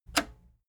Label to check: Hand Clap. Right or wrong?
Wrong